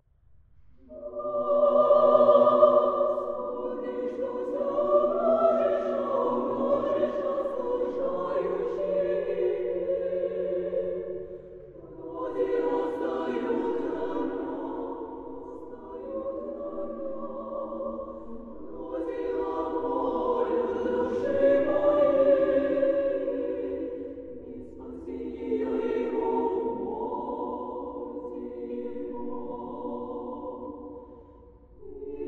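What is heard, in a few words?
female choir; Gospodi chto sya umnozhisha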